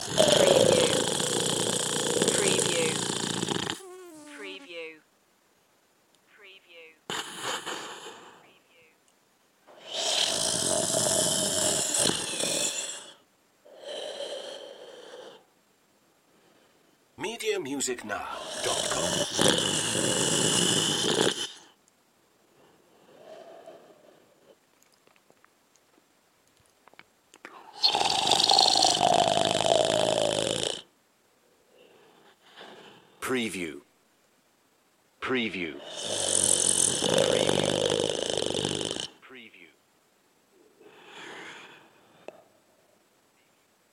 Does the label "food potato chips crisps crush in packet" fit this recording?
No